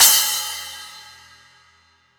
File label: Cymbals